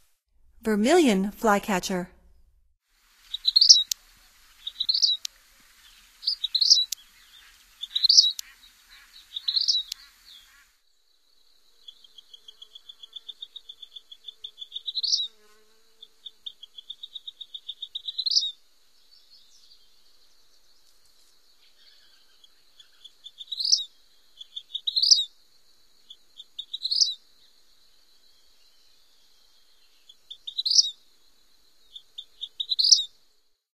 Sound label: Vermilion Flycatcher; North America; Stokes